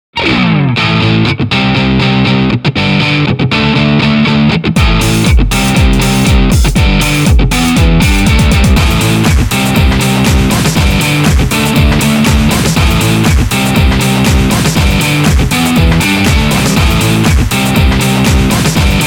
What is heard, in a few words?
Guitar OD